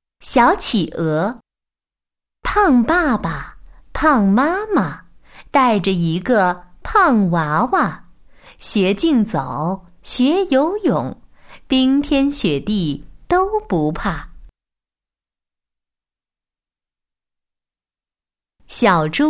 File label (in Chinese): 女 儿歌两首温柔版; 妈妈